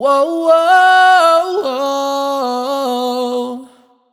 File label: riff Whoa Whoa 75 F#m wet, Vocal Sample Pack, Raw Vocals, Wet Vocals; Slate Digital Omnivox Vocal Sample Pack